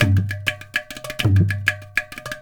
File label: INDIA L; Spectrasonics - Heart of Asia CD2